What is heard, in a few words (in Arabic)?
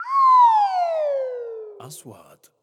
إنتقالة كوميدية